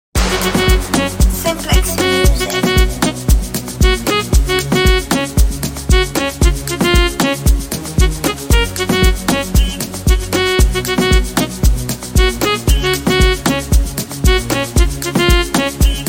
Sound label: Amapiano Version